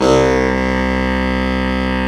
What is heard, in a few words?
BARITON SAX